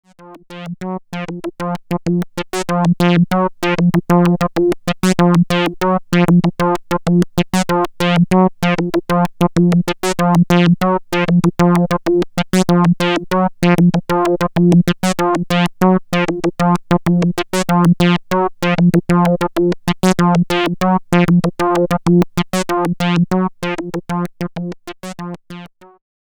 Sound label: Seq C